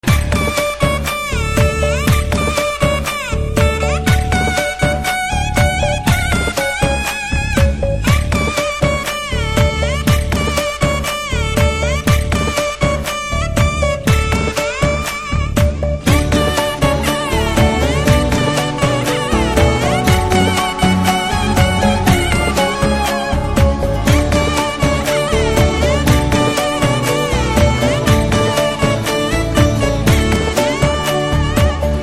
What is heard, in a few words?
Instrumental